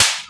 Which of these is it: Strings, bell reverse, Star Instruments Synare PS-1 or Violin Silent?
Star Instruments Synare PS-1